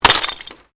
GLASS SHARD SMASH

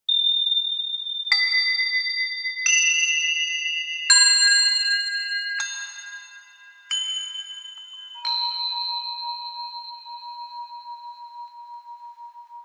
Bells Chinese Bell Tree Notes; Musical

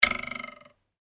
comedy ruler twang; Comedy / Cartoon Ruler Twang; Funny Sounds

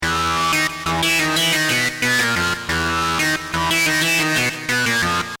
Hip Hop Synth Licks 90 Bpm E Straight

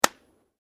PUNCH SINGLE; hand sounds; Punches and Hits; Sound Effects:Combat

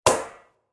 Short Metal Stab; Miscellaneous Impact; Impacts; Sound Effects:Impacts; CraftedSignals

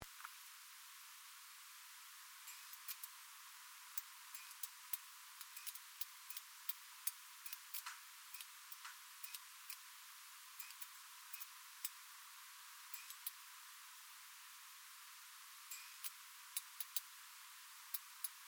FAULTY FLURO, Machinery Sound Effects; Various strange sounds; Machinery (Sound Effects:Machines)

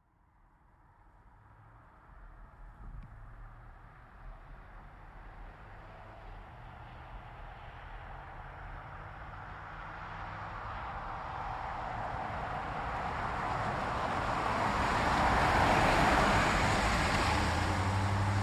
Truck GMC Savana By Corner Med; 2010 GMC Savana; Trucks (Sound Effects:Vehicles); Big Room Sound

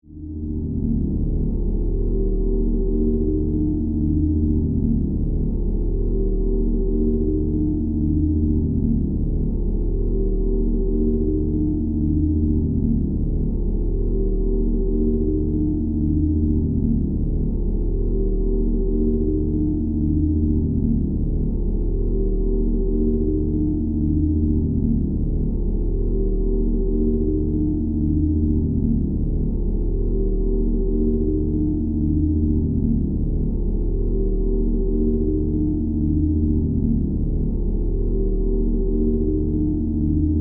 Engine Room Power, Drone, Science Fiction; Sci Fi Sound Effects; Blast Wave

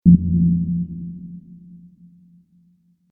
Space Style Ambient Noise, Eerie; Sci-Fi; Stuart Duffield

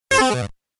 Cool Fx Video Game

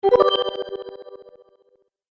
Magic Purchase Vibrate Ding; Cartoon Sound Design Sound Effects

